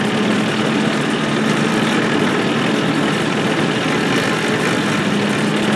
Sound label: tank engine rpm 00; engines; War Thunder